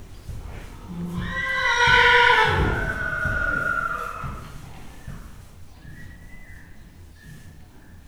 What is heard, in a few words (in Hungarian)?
két nyerítés, ló; Hortobágyi Nemzeti Park 2013; máta